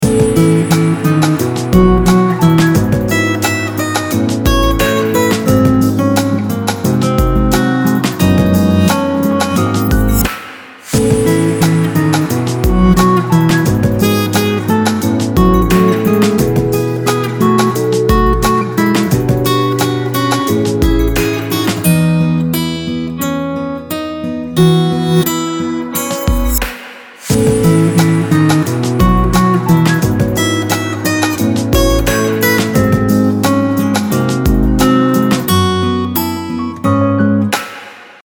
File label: Media volume, LG E975 Optimus G